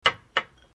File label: glass